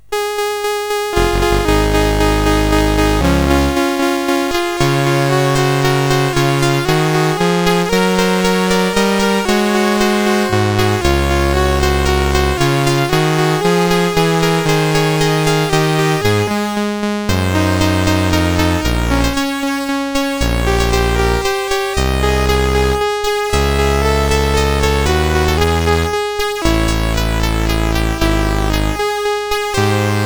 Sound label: mus CSG8580R5; Song Frederic and Chorus of Grils; Pirates of Penzance